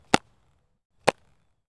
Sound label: glove, baseball